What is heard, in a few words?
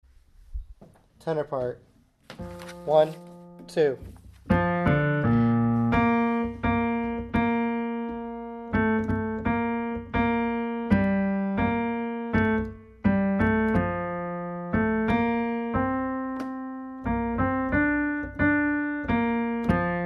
tenor